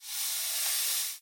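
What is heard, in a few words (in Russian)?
тайное шипение змеи в траве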